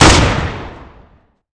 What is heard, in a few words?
elite, weapons